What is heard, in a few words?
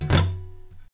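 scrape, guitars; Spectrasonics; Hans Zimmer Guitars VOL-1; TAKA FX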